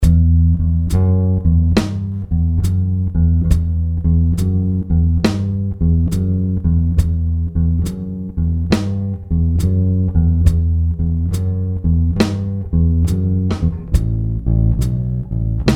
Blues

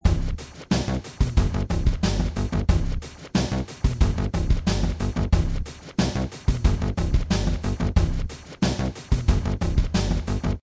Beat

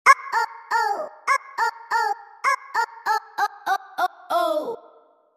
ohohoh

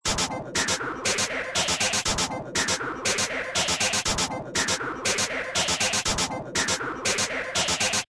Beat

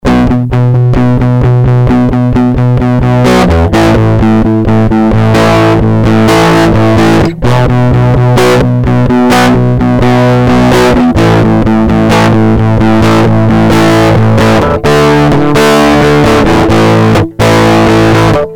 guitar

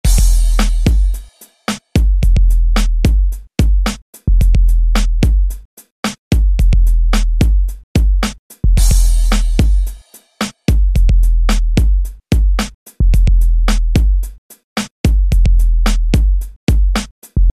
Beat

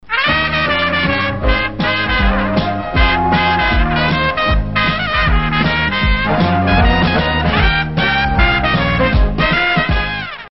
xmas tune

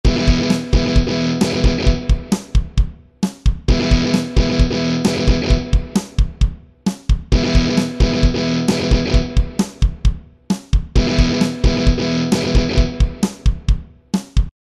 guitar

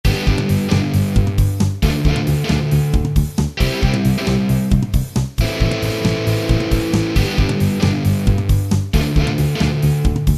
guitar

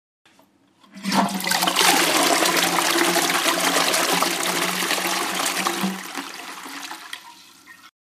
Toilet

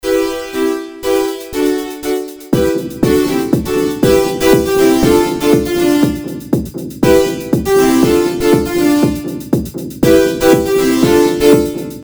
Beat

